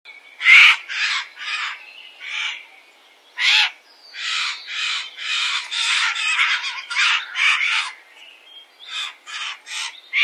Vogelstimmenspiel Eichelhaeher

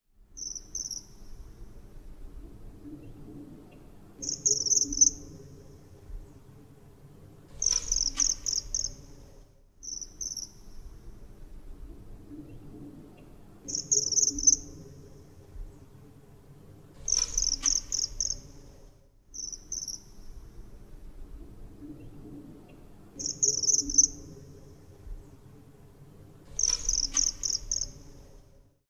Vogelstimmenspiel Eisvogel

